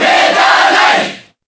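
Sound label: Cheer Japanese & English, crowd; Meta Knight; SSBB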